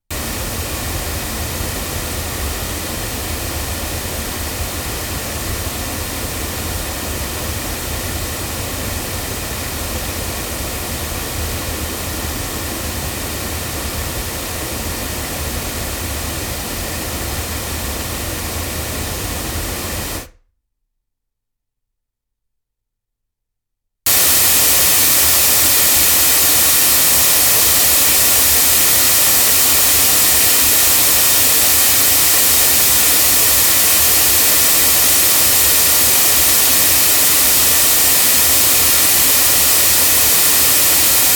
MXL 1006BP battery loudspeakers

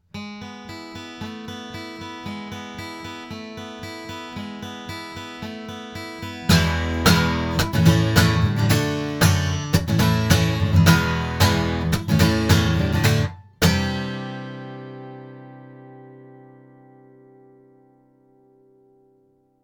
Sontronics Sigma guitar, microphones recordings

